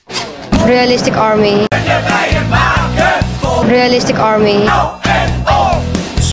earrape

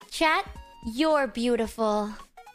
ewwww

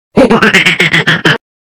sinister laugh

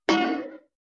bonk cartoon

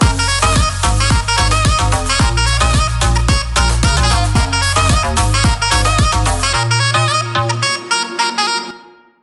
ultra sax

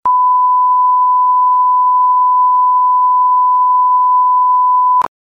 tv static color bar

